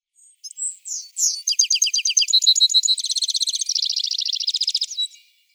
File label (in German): Zitronengirlitz Serinus citrinella; Vogelstimmen